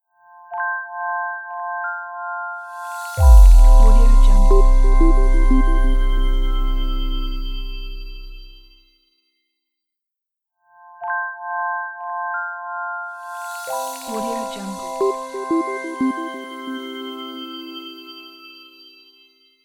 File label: clear liquid logo